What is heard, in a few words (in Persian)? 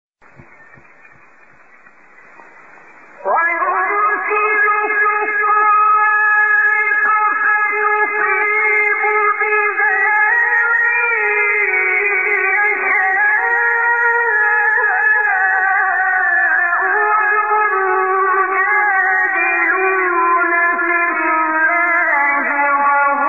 فرازی زیبا از سوره رعد; استاد عبدالباسط